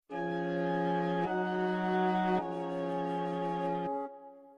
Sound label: jingle saved; newts jingles